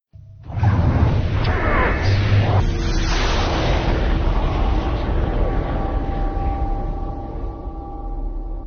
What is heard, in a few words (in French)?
decollage bruitage